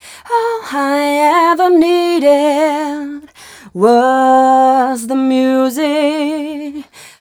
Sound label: f mel d, fem Vocals